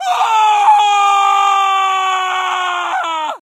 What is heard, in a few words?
Scream Male; Friday the 13th: Killer Puzzle